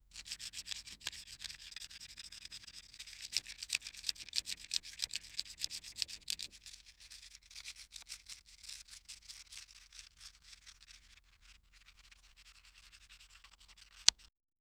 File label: rock paper scissors, clap